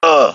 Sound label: MINOR PAIN; cash